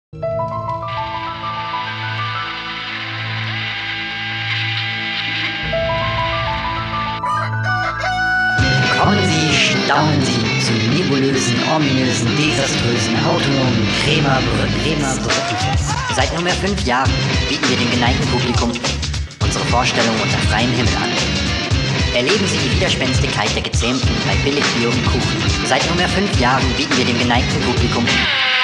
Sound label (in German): jingle redebeitrag